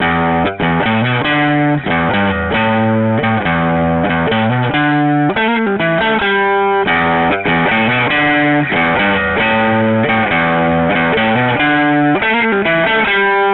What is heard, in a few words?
EQ before amp; tone control